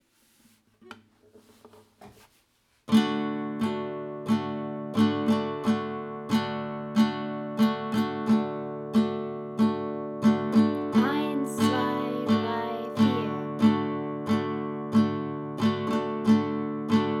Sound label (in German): Gitarrenbegleitung; Senegal